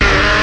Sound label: chainsawer; Weird and Interesting Hits; single hits